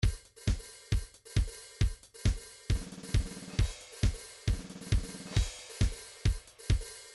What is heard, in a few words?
trance midi loops beats; newtronic trance constuction kit